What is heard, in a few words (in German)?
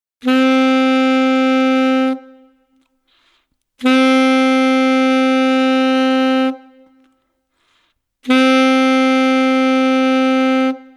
Ganze Note Alt; Saxophon